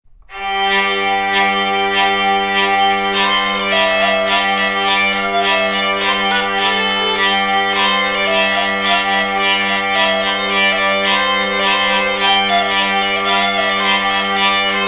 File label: hurdy